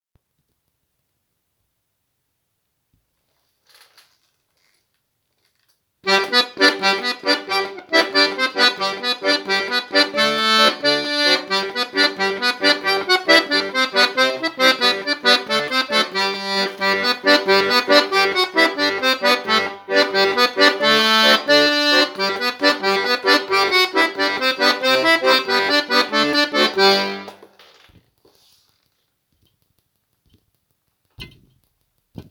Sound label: jig 90bpm; cursus samenspel 2023